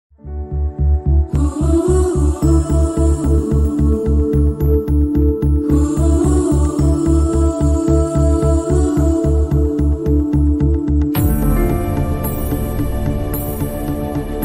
most eligible bachelor love bgm ringtone telugu bgm